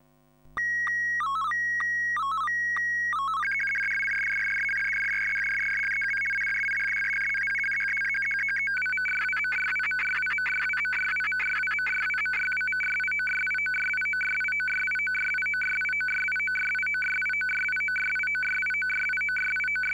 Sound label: ATV188 QRM, SSTV Signals; hamradio; AVT